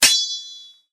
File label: Fencing Captain Parry; Pirates Of Treasure Island